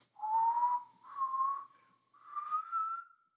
bird

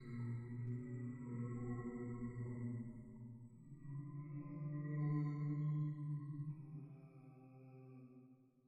hum

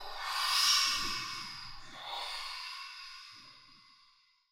waves

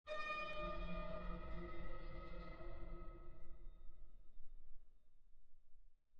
harpsichord

